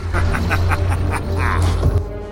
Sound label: laugh; freak fortress 2; armstrong